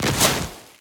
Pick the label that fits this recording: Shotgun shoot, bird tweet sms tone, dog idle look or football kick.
Shotgun shoot